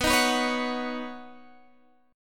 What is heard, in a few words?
C sharp 7th Suspended 2nd Suspended 4th, B